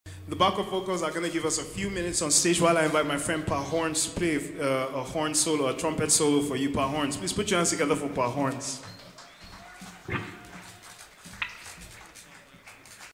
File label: Pa Horns Live